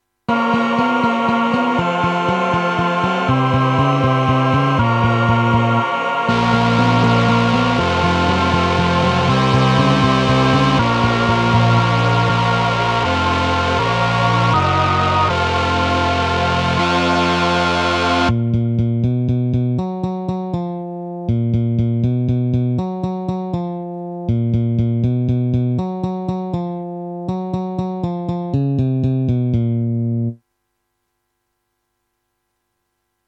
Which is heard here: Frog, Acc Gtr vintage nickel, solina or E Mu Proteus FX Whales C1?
solina